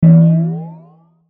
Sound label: Hit Filter Ring Modulation; Blastwave